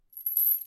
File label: chain, step